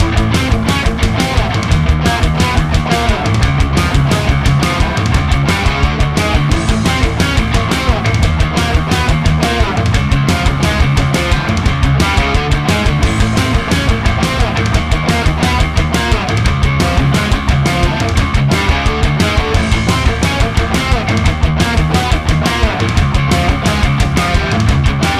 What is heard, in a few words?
Battle Loop; rogue aces